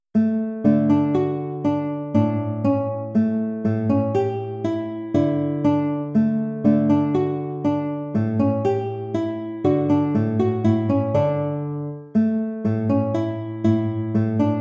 waltz; Ferdinando Carulli

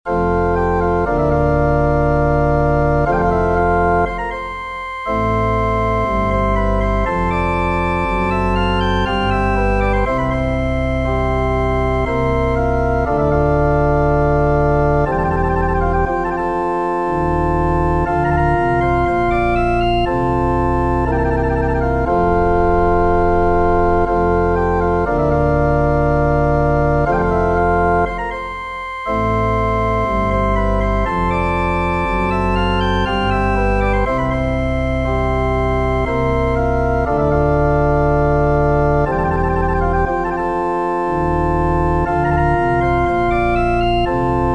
chorale prelude for pipe organ; bach johann sebastian; lamm gottes unschuldig

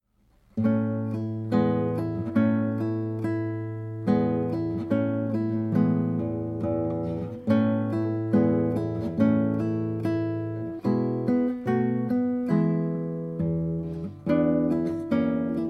nocturne; rung henrik

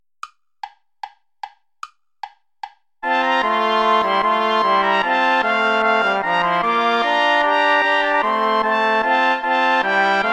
bourree play along without trombone; praetorius michael; bourree iii

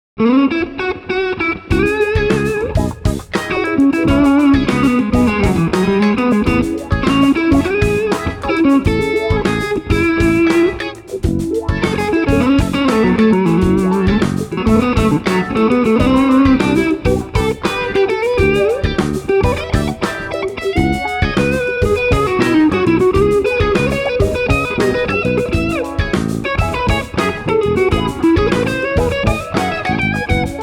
amaj7 cmaj7 d9sus; bergeron guy

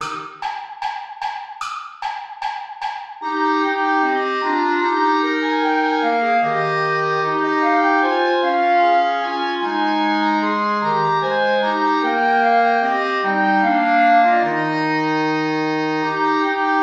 ballet play along without clarinet; praetorius michael

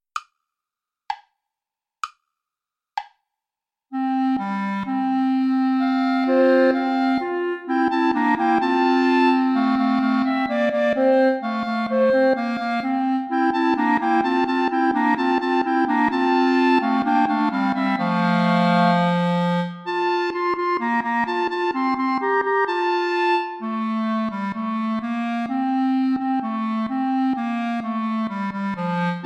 play along without bass clarinet; praetorius michael; psallite unigenito

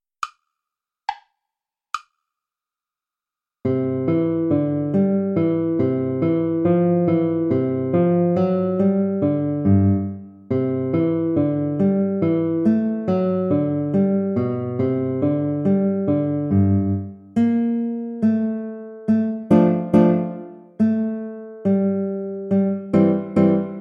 hornpipe play along

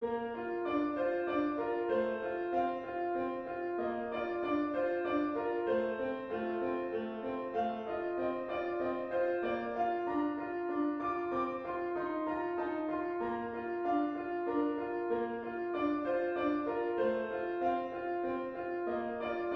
danse allemande; sib majeur

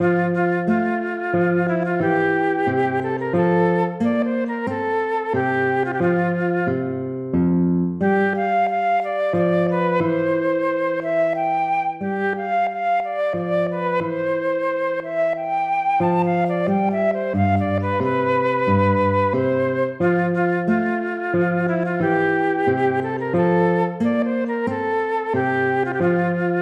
minuet; mozart wolfgang amadeus

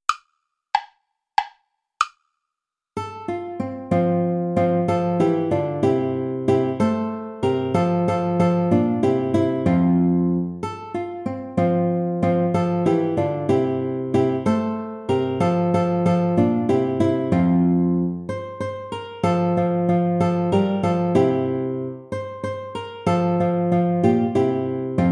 traditional play along without guitar; asikhatali

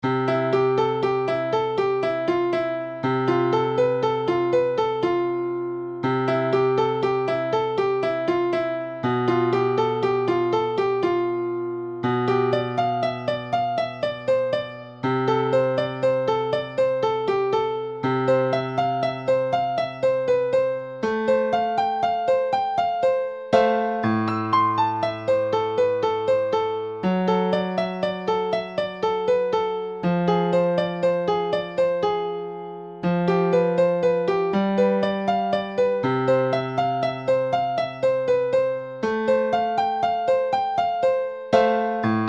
etude hivernale piano; chauve thierry

